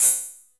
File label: phaser